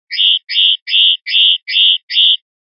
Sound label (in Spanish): golpes kui kui, pardillo